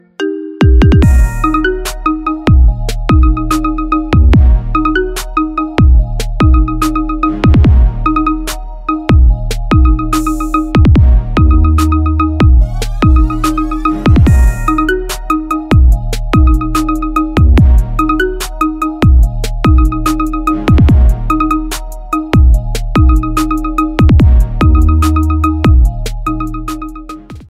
Marimba Remix

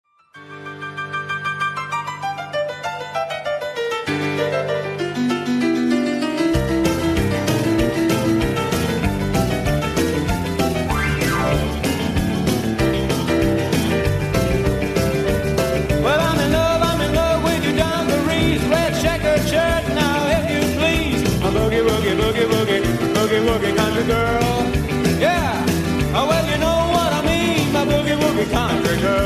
Boogie Woogie

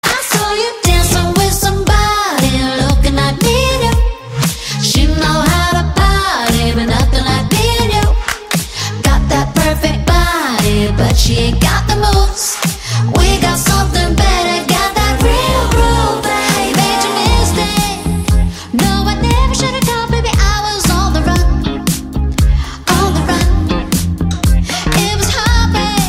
Real Groove

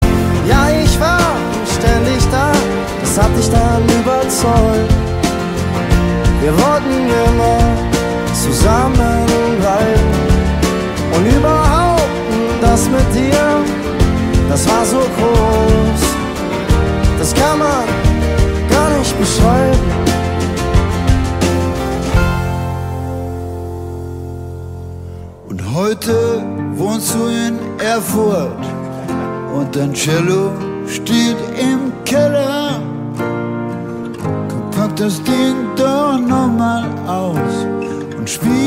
Cello

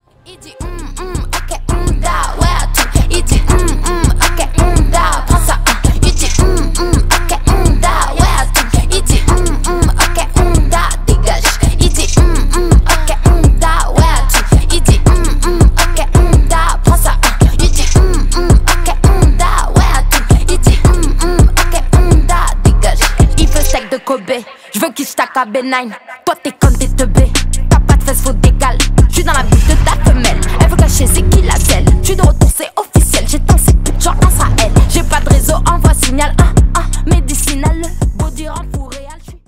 hiphop

